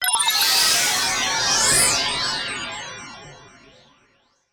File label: Op Degital